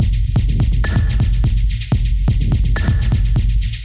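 berlin berghain techno dirty loops F sharp minor 125; drum loops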